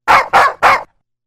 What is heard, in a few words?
Barking Dog; Soundzabound Volume 3 Disc 3